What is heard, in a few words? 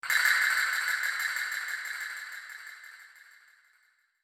dying castanets